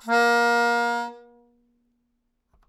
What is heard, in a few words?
Melodica Long, Long Notes Chromatic